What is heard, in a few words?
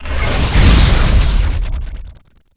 Explo Large Energy Elec; Destruction